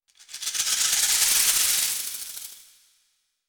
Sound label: Stingers shaker Soft